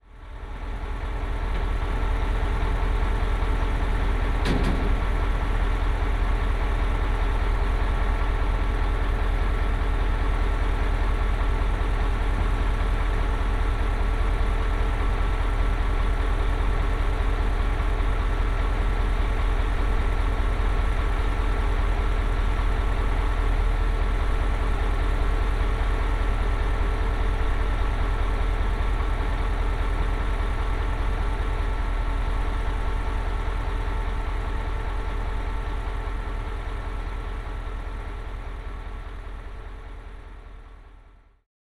street sweeper filling; sergiyev posad

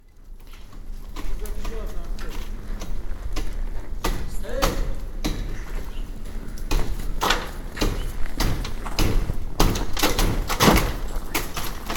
snow roof; sergiyev posad

